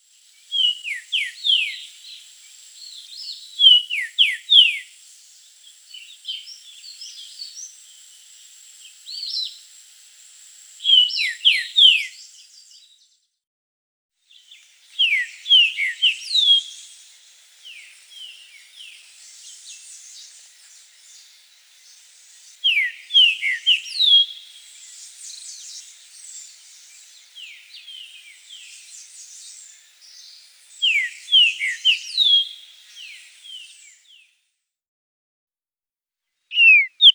Saltator gros bec Lesser Antillean Saltator, chants des oiseaux; Guadeloupe